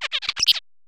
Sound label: synthetic sound